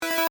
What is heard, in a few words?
Beep Chime Electronic, Scifi, Beep, Chime, Electronic, Science, Fiction; BlastWave